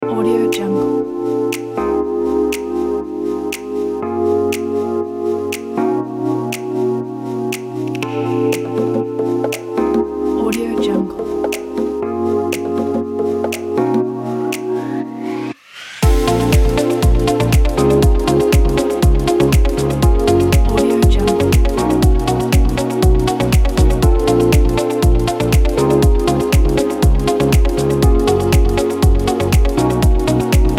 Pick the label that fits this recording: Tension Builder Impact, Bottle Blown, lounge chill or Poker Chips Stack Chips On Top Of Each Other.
lounge chill